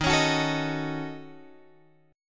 D sharp Minor 7th Flat 5th D sharp down Guitar Open F; strummed